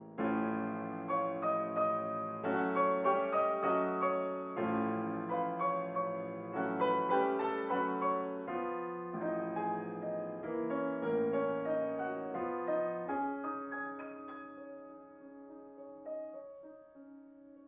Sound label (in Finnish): ekspressiivinen valssi